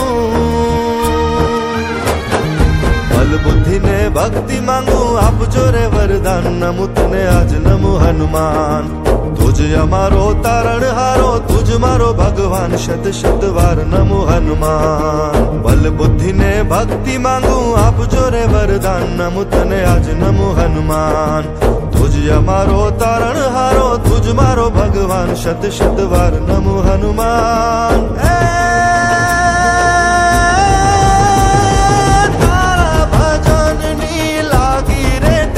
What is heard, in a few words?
Namu Hanuman Bass Ringtone